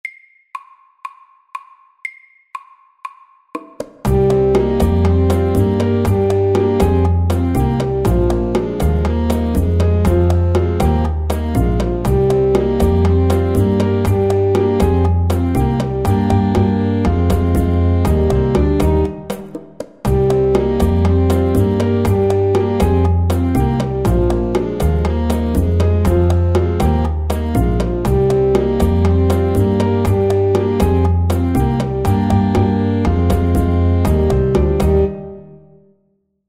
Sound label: Driving saxes FAST 120